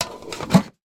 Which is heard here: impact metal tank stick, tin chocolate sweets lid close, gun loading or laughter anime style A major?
tin chocolate sweets lid close